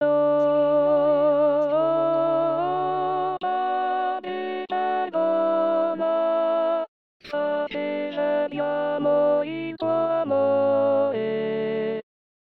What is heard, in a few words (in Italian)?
Contralti